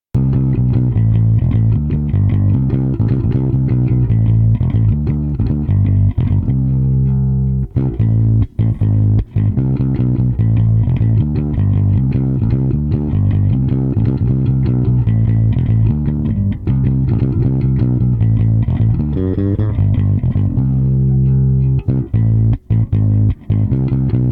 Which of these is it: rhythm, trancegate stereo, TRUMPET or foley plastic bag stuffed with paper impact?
rhythm